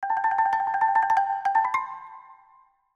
木琴のアイキャッチ

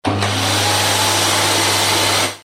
掃除機の音

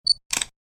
カメラのシャッター音

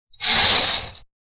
カーテンを開ける音

